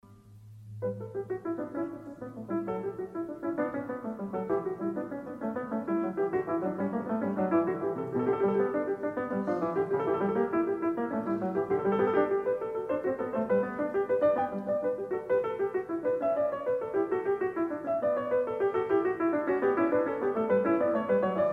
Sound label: Bach Goldberg Variations; Klassika; Bach, Johann Sebastian